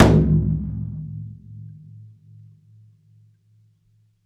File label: TKO hira hit slappy, Jungle